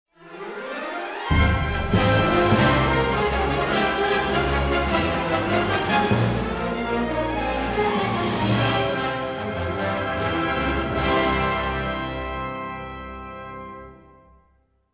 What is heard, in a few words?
Galactic Assault Victory; Star Wars Battlefront II gamerip 2017